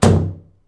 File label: closing door